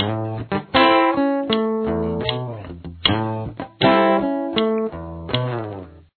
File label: slow, guitar